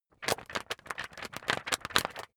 magazine wobble hard

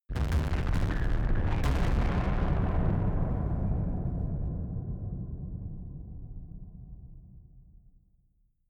distorted blasts

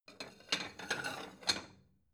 plate small shelve slide soft

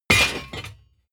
plate armor piece drop heavy

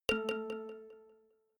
notification kalimba delay

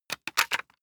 plastic device handling clack

